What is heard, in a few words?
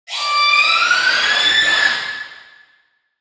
wendigo angry, utterance